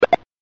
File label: kick